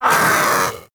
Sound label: Vocal Pain; Hunting Critters; Vulpaphyla